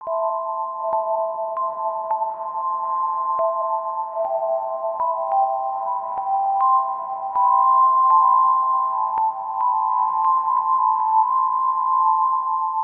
phone incoming call

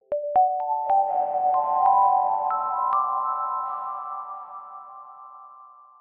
desktop logout

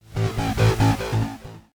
screen capture

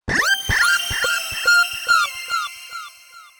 audio test signal

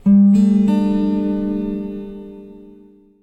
device added

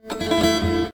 window question

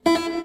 window attention

